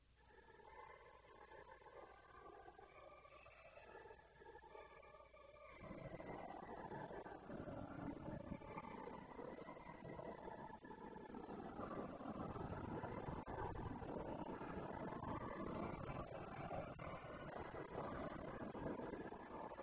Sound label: Fugue, organ; Akiko Lewis